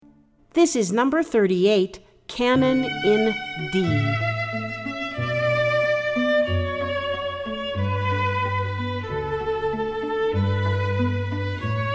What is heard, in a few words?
Canon In D